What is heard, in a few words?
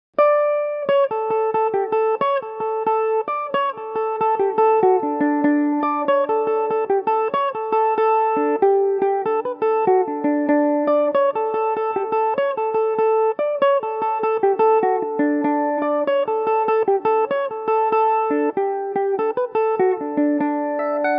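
fiddle D major revelation, irish mandolin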